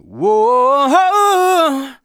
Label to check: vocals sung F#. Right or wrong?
Right